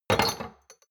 shot glasses place multiple medium; soundpool

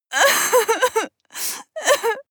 female cry; koerpergeraeusche; menschen; soundpool

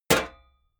sheet clank damped

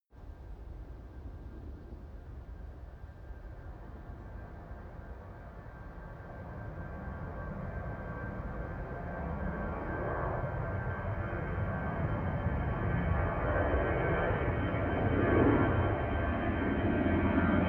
plane flyby land tonal howl

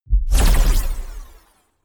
sci-fi plasma gun fire digital; pistolen gewehre

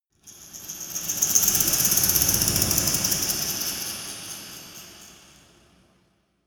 dark resonator rattle dynamic; maschinen

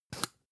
spice jar big scratch open; industrie wirtschaft; gastronomie

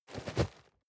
cardboard box medium shake single; objekte

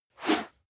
whoosh whistle

